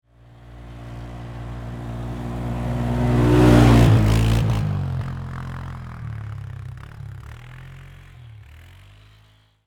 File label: ATV Pass By, Sports, Atv, Pass, By, Close, Medium, Speed, Polaris, 500Cc, Four, Wheeler; BlastWave